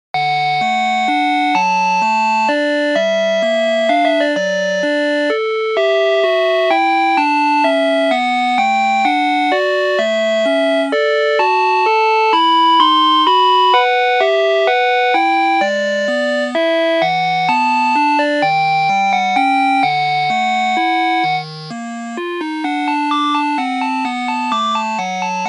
電話の保留音っぽいBGM

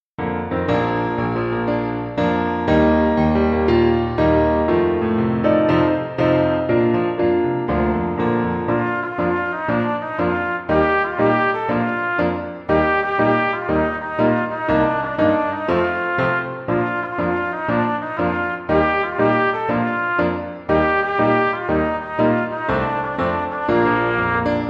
校歌風の曲

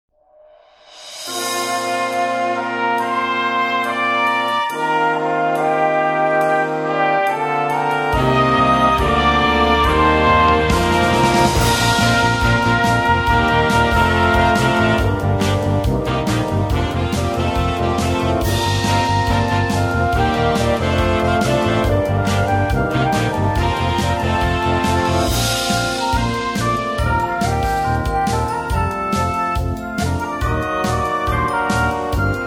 文化祭のブラスバンド

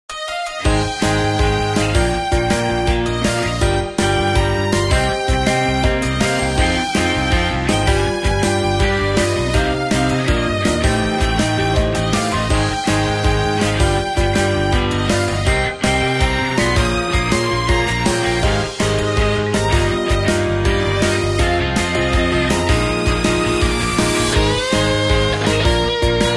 ポップロック

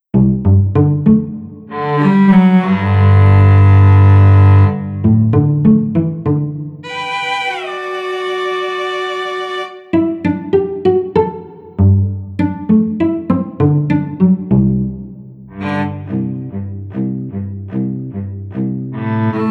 チェロの独奏曲; チェロの独奏っぽい曲

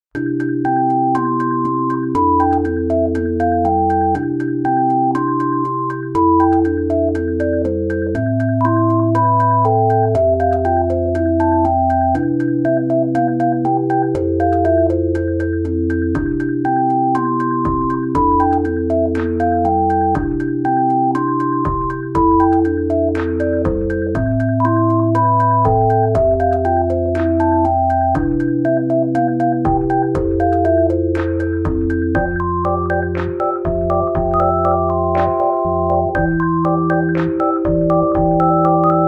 白い部屋

